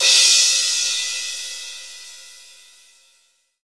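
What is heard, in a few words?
cymbal crash; K-Crash st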